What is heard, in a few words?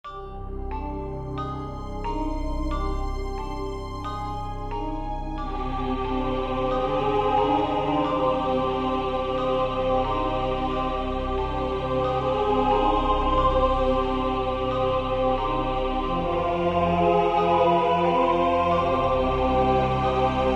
haunted valley music; painkiller overdose